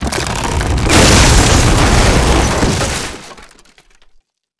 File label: wood beamfall